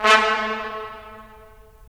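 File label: brass trumpets staccato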